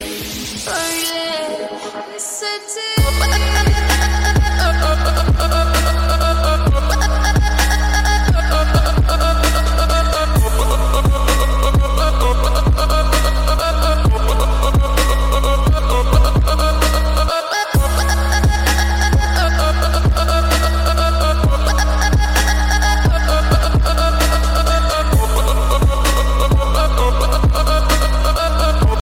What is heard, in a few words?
background musical tone